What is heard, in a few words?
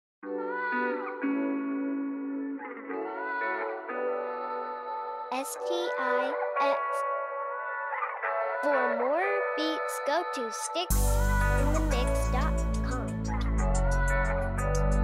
Guitar Wash